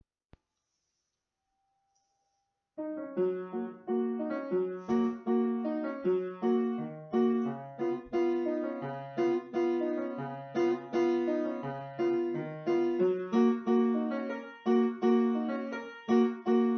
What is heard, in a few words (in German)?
flohwalzer